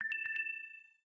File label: Low Battery